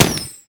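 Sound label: fireworks phosphorus bomb; Fireworks Corki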